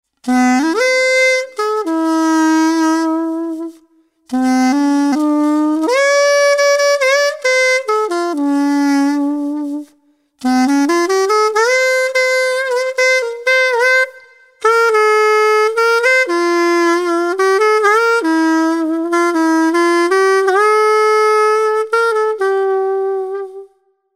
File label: Mini sax C